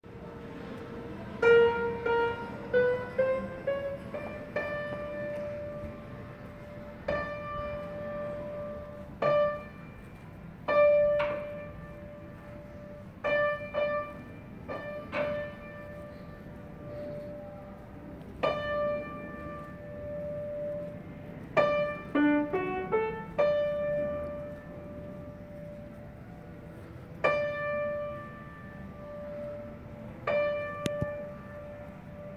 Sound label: piano tuner